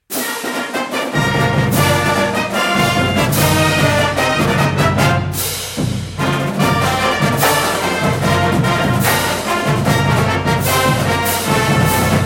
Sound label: fight song short, marching owls; FAU